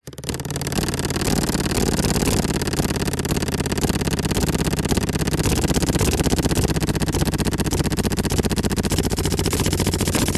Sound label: vehicles bicycle pushbike wheel spokes spin paper against clicks long burst